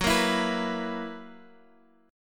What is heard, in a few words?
F sharp 11th F sharp Guitar Half Step Down